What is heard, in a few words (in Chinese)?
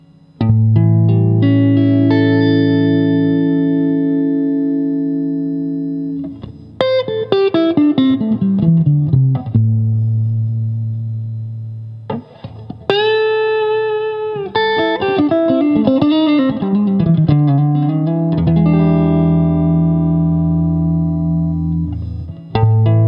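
60S OCC 单晶铜 清音 2档, acoustic guitar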